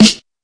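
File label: classic hip hop Snare; Classic Hip Hop Mega Kit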